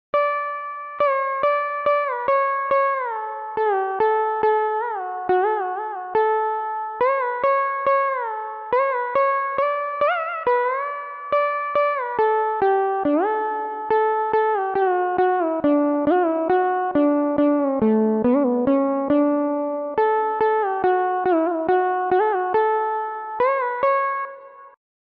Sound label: Carnatic